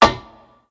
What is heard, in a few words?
Ladder; blue archive